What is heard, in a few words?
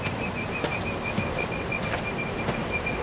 Vulcan Tricorder Walking; trek